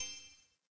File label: coin count end; santa vs rudolf